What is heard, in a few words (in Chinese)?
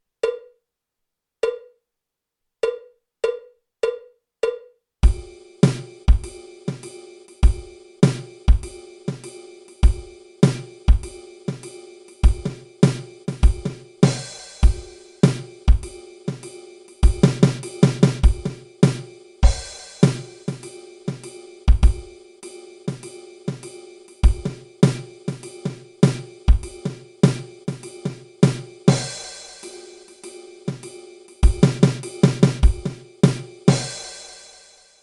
架子鼓独奏 爵士风格; 66条架子鼓独奏SOLO